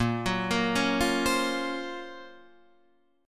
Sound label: F sharp Major Sharp 11th A sharp